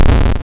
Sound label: Explosion